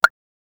sipping sound, drink

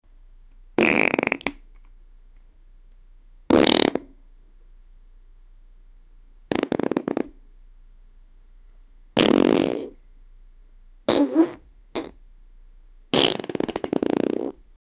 farting in public; awkward

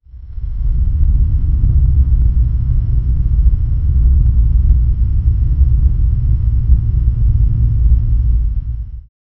constant loud rumble sound

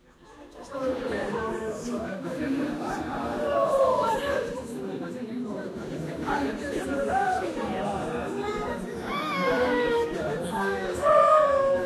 a group of people gently

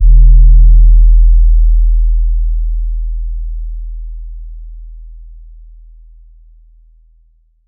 overdriven 808

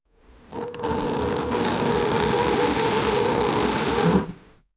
amour foley sound, armor

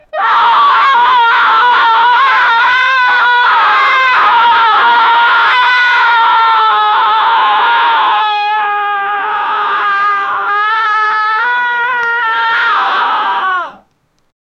crazy fast screams in ago

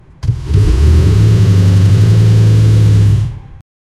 a 2000 hp hypercar; automobiles

